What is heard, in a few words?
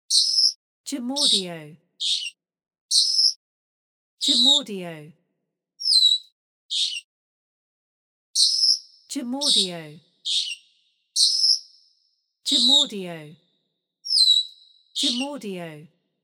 Jungle Birds